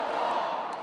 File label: Ringtone